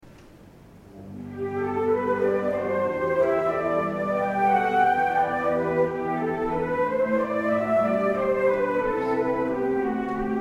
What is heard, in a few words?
consert, flute; arietta-flute